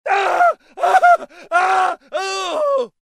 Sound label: ahhuhhehh